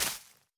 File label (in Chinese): 苔藓破坏声